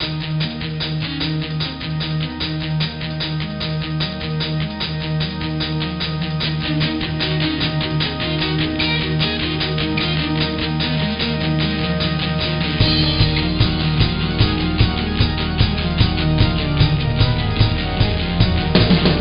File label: mystery music